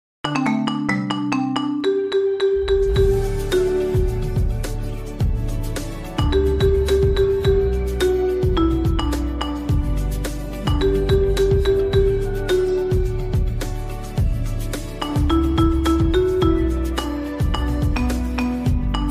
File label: Marimba Remix